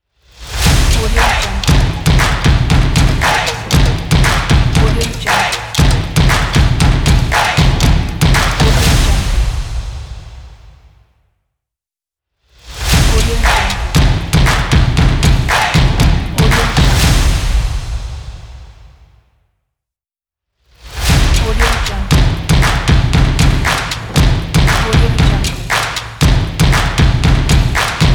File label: sport drums logo